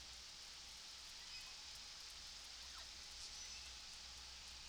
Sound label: cool flute call